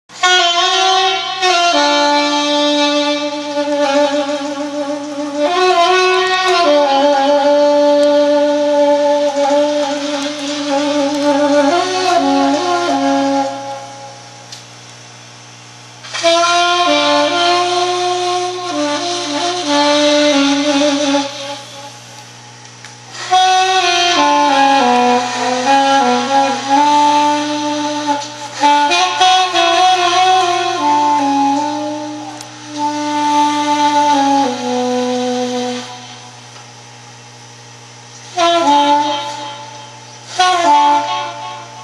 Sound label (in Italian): Sax soprano curvo